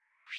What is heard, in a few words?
window open; Nokia N900